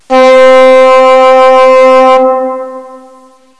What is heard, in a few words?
Flute